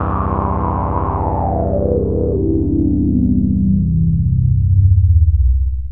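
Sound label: 2 Pole Filter sweep; Arturia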